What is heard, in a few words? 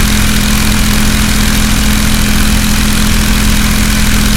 stuka side, engines; War Thunder